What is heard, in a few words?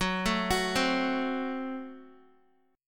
Gb Diminished Guitar; Gbdim arpeggiated; x,9,9,11,12,x